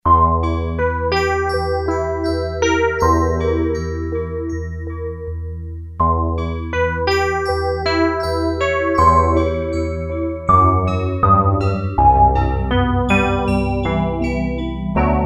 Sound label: Metal Chase, Roland MKS-70